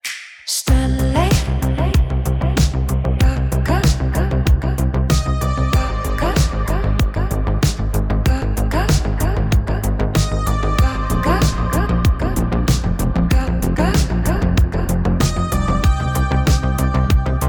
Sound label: electronica